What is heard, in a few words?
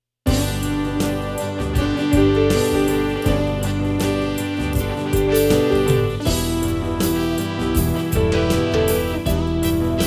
dgx 630 g octave